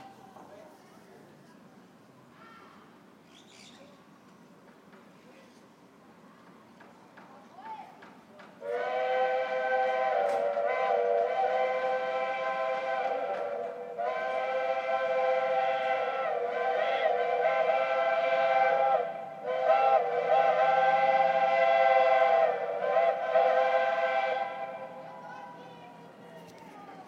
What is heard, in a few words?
steam train